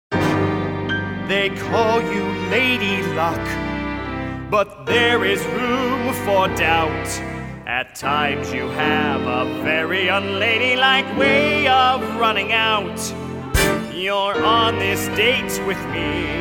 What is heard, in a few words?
vocals; sky masterson